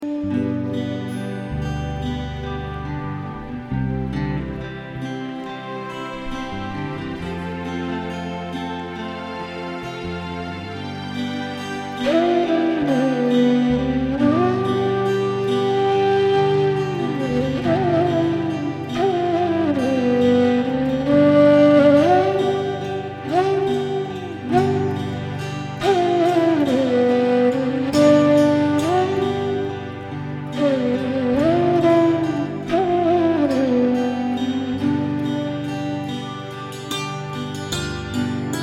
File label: ambience raga bageshri; harmony